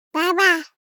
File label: BABIES TALK Boy 12 months Baba; Baby Boom